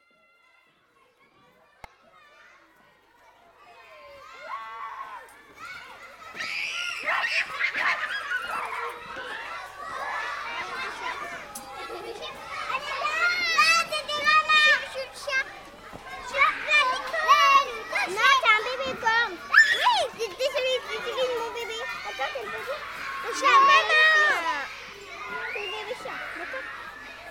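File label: School's Courtyard Cour École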